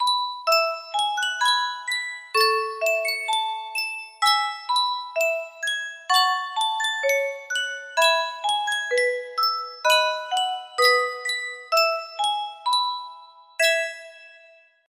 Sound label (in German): spieluhr